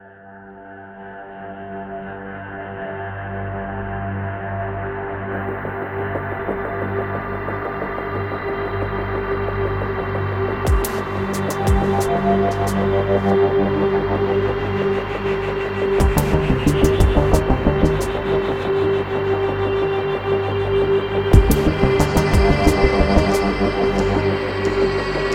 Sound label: avg suspenseful intro, music